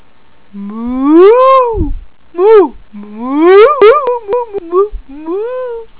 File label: moo